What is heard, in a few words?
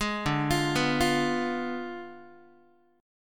D Diminished 7th D down Guitar; arpeggiated